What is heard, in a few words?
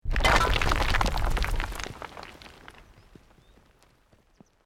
ROCK DIRT SLIDE SMALL; Created dramatic effects of buildings collapsing or breaking; Materials